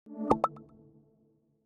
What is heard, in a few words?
ui sound